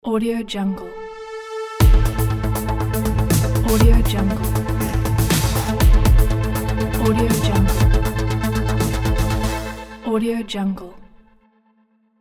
news intro

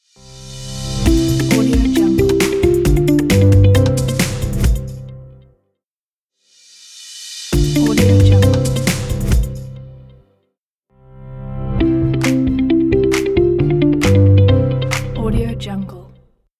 corporate upbeat intro logo

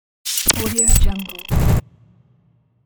glitch logo

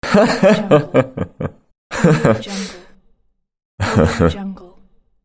male laughing

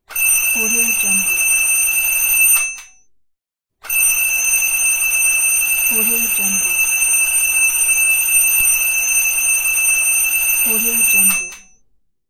analog clock alarm; cat track

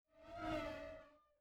spaceship pass by humming whirring whining airy; prosoundfx

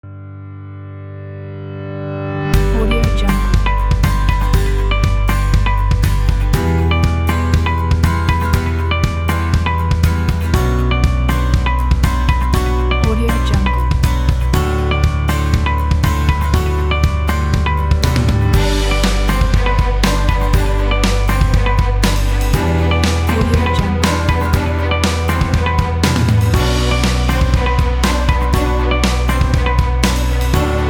advertising

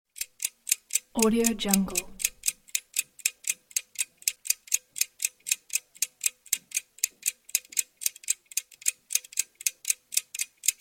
clock ticking

